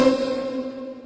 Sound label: surprise pling notes